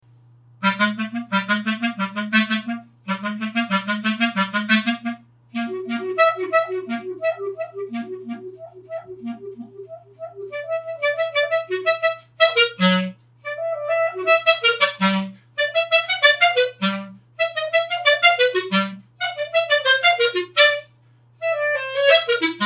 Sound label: Run, clarinet